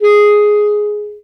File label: Bass Clarinet G#3; DirectWave; Varazdin Orchestral